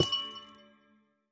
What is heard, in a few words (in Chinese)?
紫水晶簇放置声